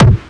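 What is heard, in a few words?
Mch Gun Fire, machine gun fire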